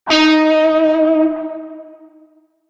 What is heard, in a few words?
Shawzin Flourish Oct Three D Sharp; Music; Warframe; Narmer Shawzin